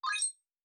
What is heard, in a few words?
listen Together Member Enter; NetEase; CloudMusic